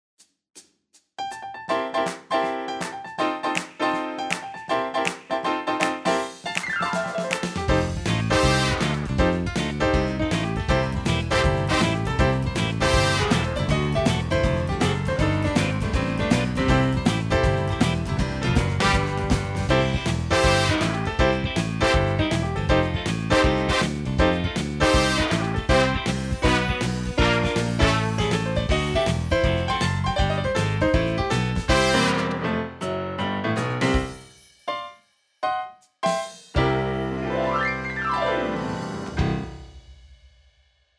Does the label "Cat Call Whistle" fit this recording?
No